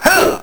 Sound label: "Heh"